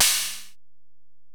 HiHat, Alesis DM-PRO

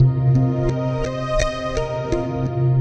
Melody, IDM; Sonic Foundry (Sony Creative Software); Twine-IDM

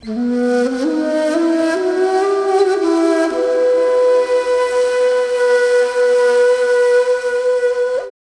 SIERRA FLU

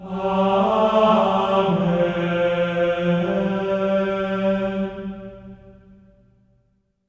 AMEN G4, Symphony of Voices; Spectrasonics; GR AMEN

